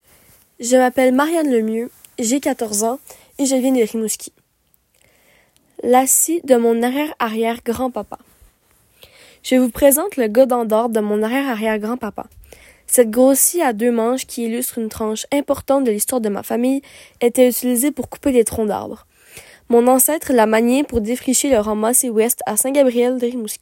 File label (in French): scie; cubes de patrimoine